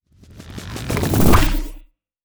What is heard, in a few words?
Chargeable Popping Scifi Gun